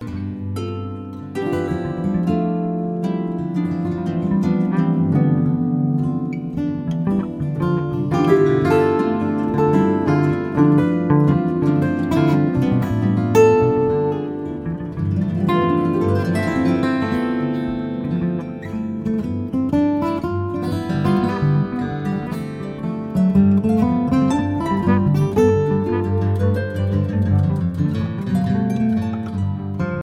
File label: Dark Star Acoustic